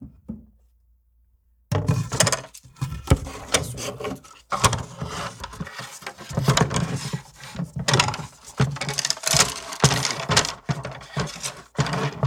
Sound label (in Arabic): جمع الواح خشب, woods; sound effect